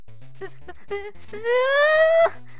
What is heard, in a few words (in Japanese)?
ダメージボイス; 悪魔城ドラキュラ(FC版)